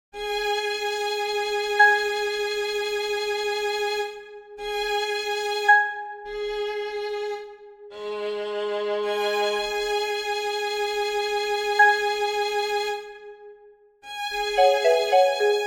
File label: for marimba strings; ravel maurice; vallee des cloches; miroirs